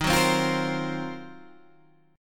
D sharp Minor 6th Add 9th D sharp Guitar Open G, chord